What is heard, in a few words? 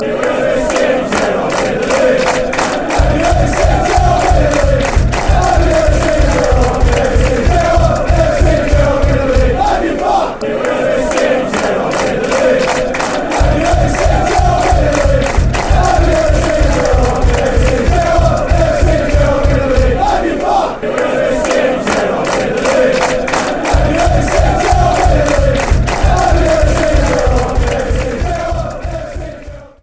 fanchants; gerrard win league